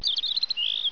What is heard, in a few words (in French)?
oiseau